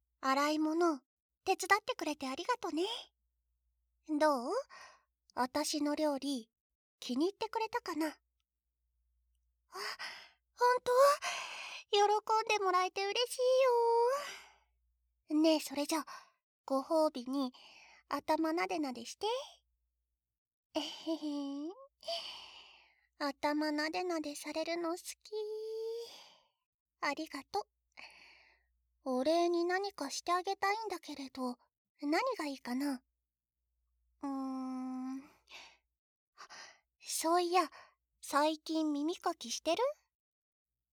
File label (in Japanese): 膝枕に耳かき; 膝枕に耳かきって新婚さんみたいだよね; NO SE